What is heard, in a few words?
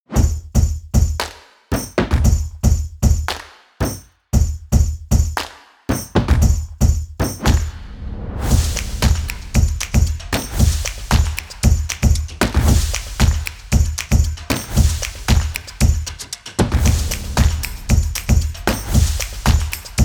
Drums; Sound Gallery by Dmitry Taras